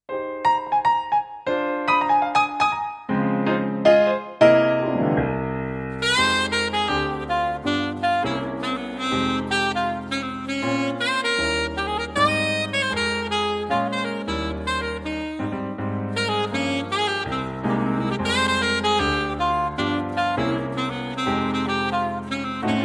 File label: Sax Piano Duo